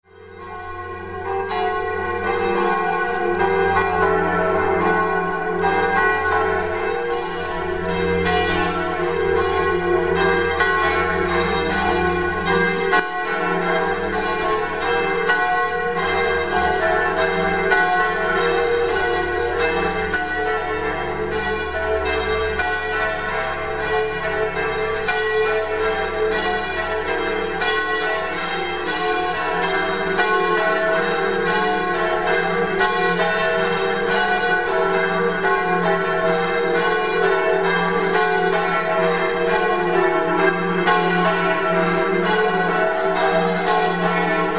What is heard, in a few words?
barga bells